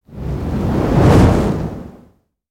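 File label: fireball explosion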